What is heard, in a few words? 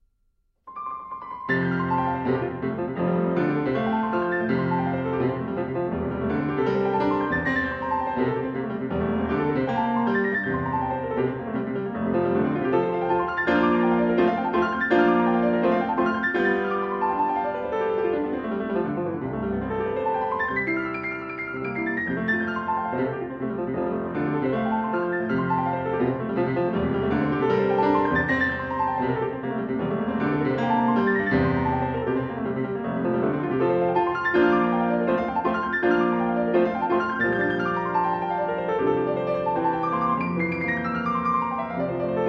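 Etude op 10 No 8 in F Major Allegro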